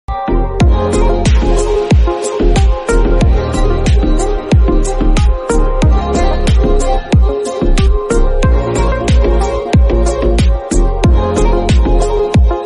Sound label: Instrumental, ringtone